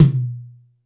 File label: B2 - Room Tom, Yamaha PSR-E323 Room Kit